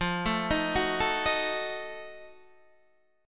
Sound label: F 7th Flat 9th, Guitar Open D